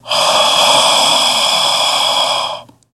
Werewolf Breath, Airy Long

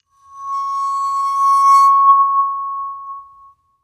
Tone Bar Bowed, chimes

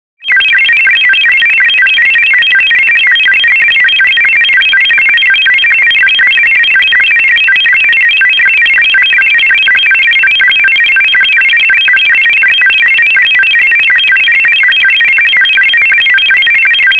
Bleeps High Rippling

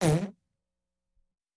Dry Fart; farts

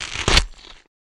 Bell Pepper Rip Knife; gore stabs & eat

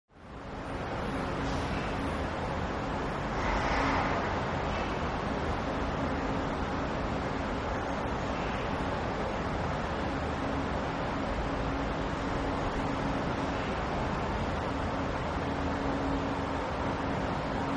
Heavy Traffic Tunnel, big city

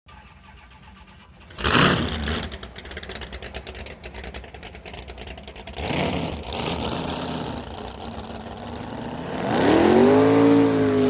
Drag Boat Start revs

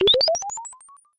synth efx rise

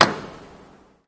Bed Motor Elect End; latches & bolts

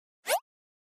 Pop Up Elect Whip

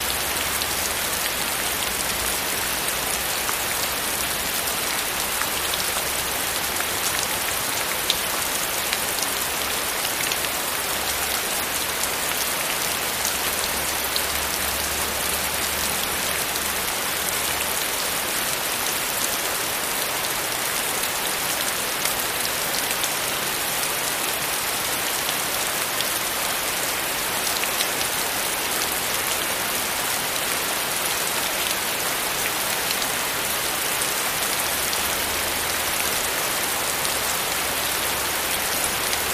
Rain Hard Stdy On Pav, heavy

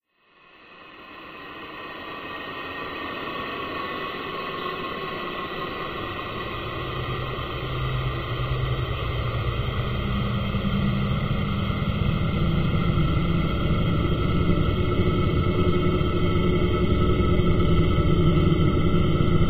Slow Airy Rising, deep

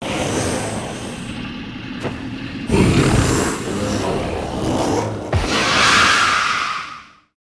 Monster Combine, monsters

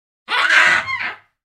Baboon Scream

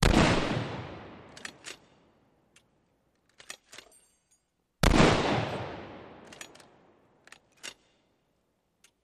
.50 Caliber: Fire And Reload. Dual

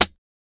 Book Slam Slap Book

